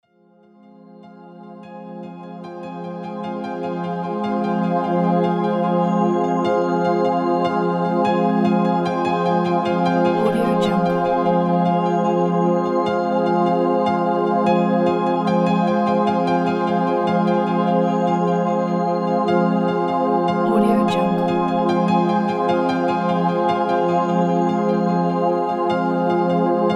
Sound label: melancholic space